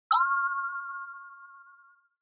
Inspiration High; ひらめき10-1(高)

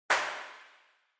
Handclap Synth, Reverb

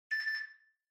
Washer Front Load Beep Far Short

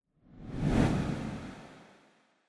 Camera Motion Low Effect; シーン・カメラモーション

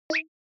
Short Accent High

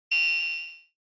SNES Shooter Shoot

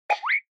Motion Eating

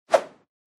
Motion Swish

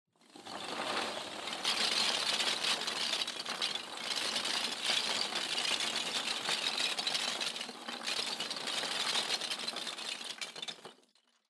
Loose Fill Packaging Put In Box; 梱包材

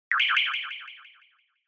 Short Accent High Delay

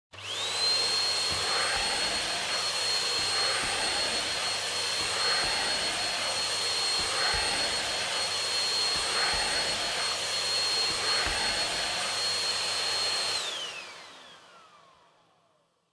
Vacuum Cleaner Vacuum Floor; 掃除機; バキューム 床

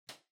Light Switch Rocker, Far Dry Mid

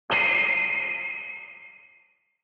Retro Anime Accent Low Short

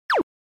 Arcade General Pitch

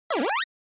RPG Cure

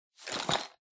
Pencil Case (Shake)

